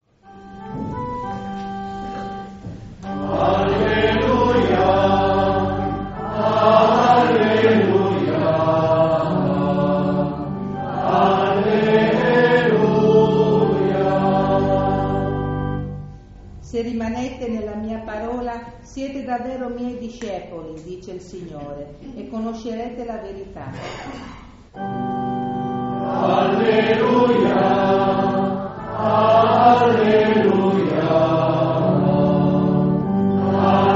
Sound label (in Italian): versutta, campane